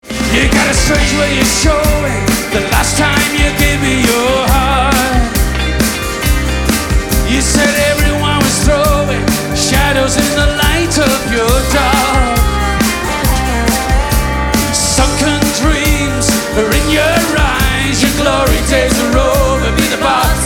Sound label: Live Mix